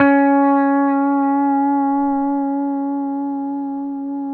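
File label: pedal steel C#4 right; PEDSTL NOTES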